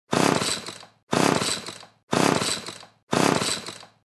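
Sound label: chainsaw start; benzopila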